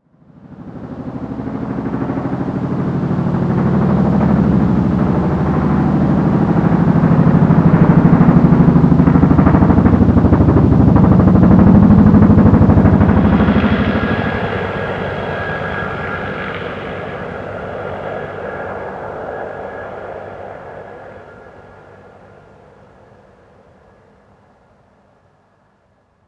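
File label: helicopter flyover; ModernWarfare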